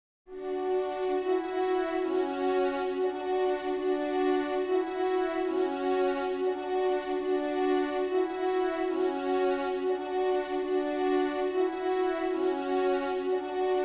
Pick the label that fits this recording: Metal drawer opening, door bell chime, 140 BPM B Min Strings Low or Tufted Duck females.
140 BPM B Min Strings Low